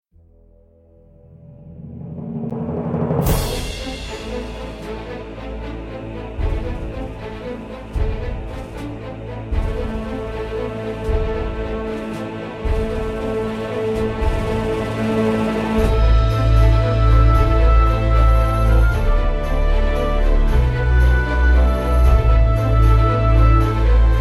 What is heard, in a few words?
Orchestral Battlefield